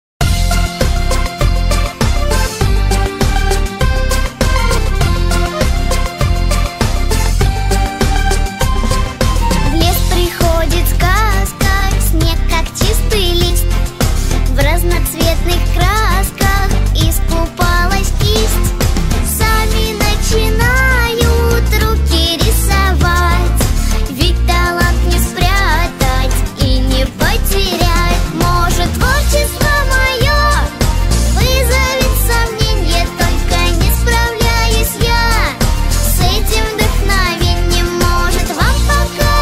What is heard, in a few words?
ultra funk brazilian phonk; masha and the bear